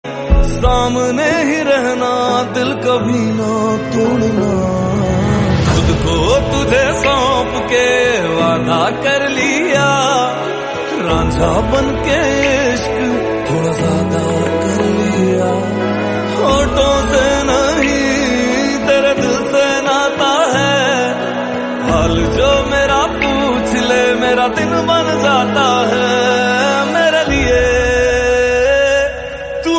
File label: Hindi Song Ringtone